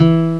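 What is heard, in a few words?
Guitar (nylon) (E3); X-Wing; X-Wing Patches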